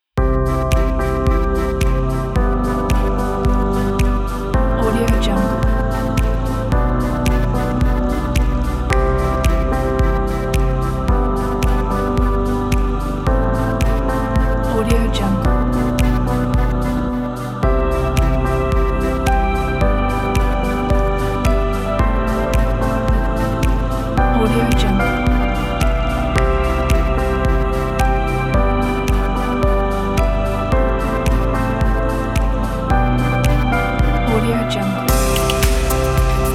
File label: experimental pack